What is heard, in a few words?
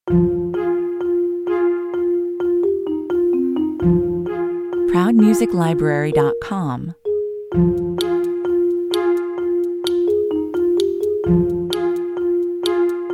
A simple but effective track at conveying tension - all-be-it in a light and quirky manor!